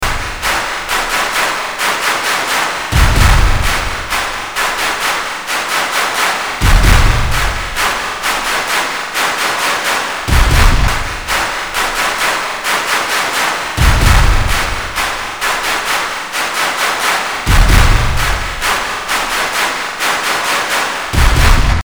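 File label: Drum classic Bum bum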